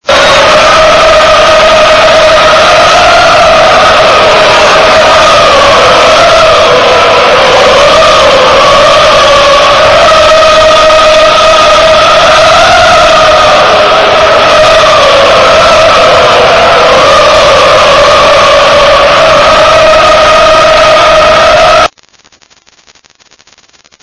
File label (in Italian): Cori ohohoh; calcio; REGGINA